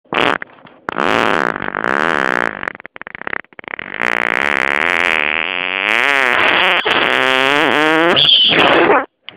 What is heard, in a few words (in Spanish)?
Pedo de indignacion